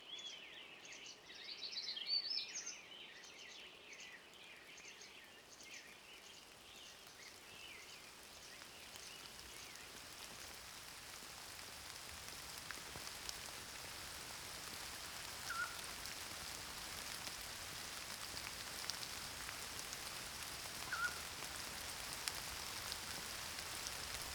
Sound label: Rain With Pygmy Owl; Morning Songbirds